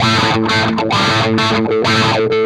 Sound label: Electric Guitar, Loops; Guitars